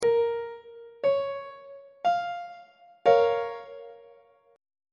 a sharp minor chord; on treble clef

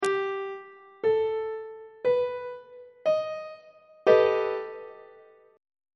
c flat augmented 7th 2nd inversion on treble clef

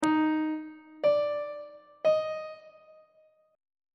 d sharp 8th interval pitches on treble clef

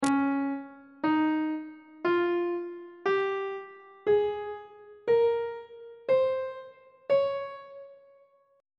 c sharp lydian mode; treble clef

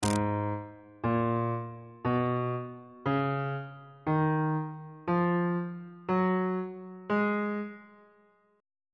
g sharp dorian mode note positions on bass clef

